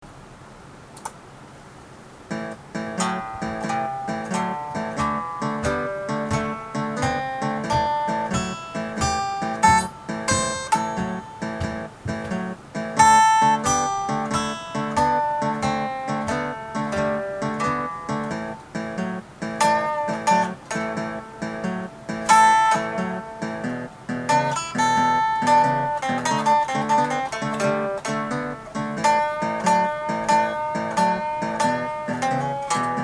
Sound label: A blues my adlib